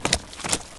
Greater Basilisk Move Sound

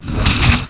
Ghost Dragon Attack Sound; Heroes3

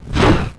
Archangel Attack Sound; Heroes3

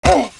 Wood Elf Hurt Sound; Grand Elf

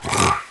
Troglodyte Defend Sound; Heroes3

